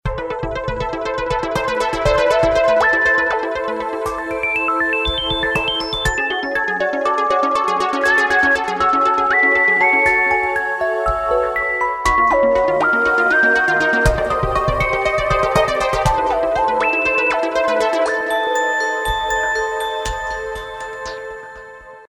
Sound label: suspense, ringtones; Doslm suspense; Coolpad